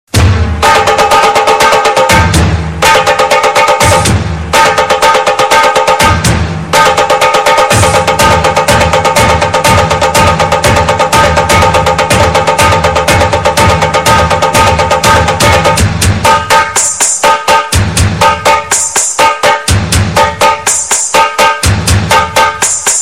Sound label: Energetic